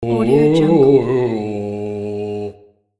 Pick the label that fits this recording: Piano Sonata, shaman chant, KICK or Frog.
shaman chant